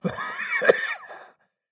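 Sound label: sounds of wheezing in a person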